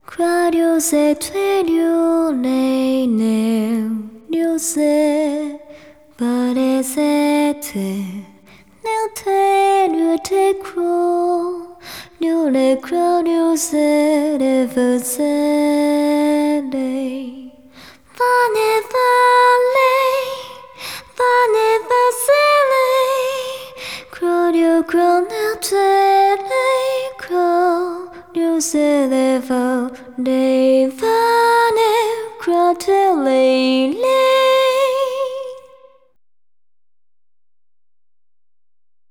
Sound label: Hymn; tales of the abyss 2005 ps2 gamerip; Fonic Hymn